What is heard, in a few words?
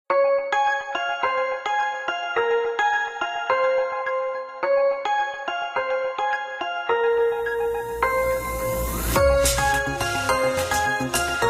Synthesizer; Honor; X5; ringtones